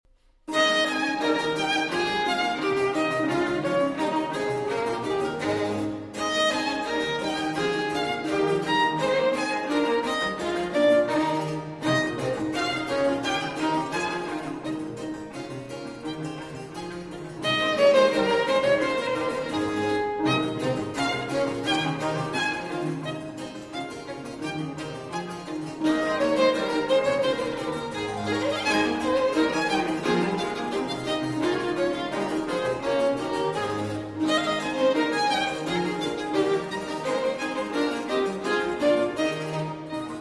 Violin Sonata in A Major, Tempo di gavotta. Allegro